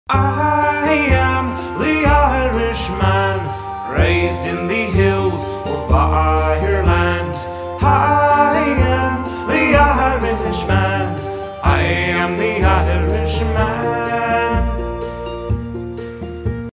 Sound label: Irishman Theme Song